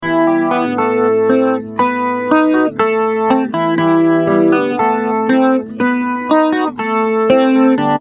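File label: acoustic guitar ensemble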